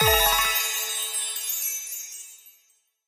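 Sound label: red envelope open; angpao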